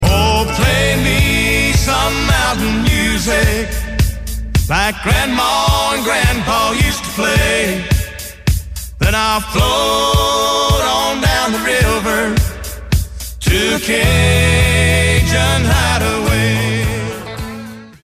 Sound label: Alabama Mountain Music